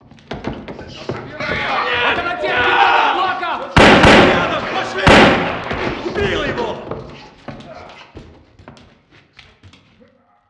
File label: village assault killing; scn village assault int killing; Modern Warfare